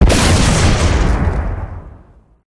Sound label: rocket explosion; gow; silverback